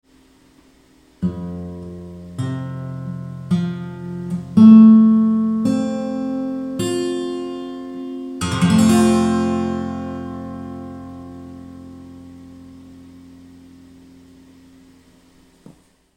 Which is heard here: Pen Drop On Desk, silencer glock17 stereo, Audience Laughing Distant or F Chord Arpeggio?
F Chord Arpeggio